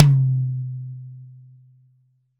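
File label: LD High Tom, Roland BK-5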